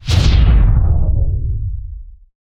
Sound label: combat air plasma; geoscape